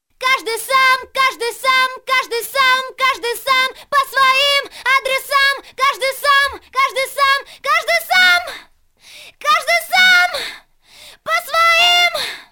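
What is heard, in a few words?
chorus scream, acapellas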